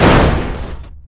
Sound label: anvil